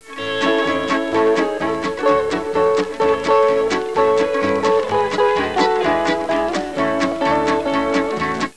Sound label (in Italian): musica strumentale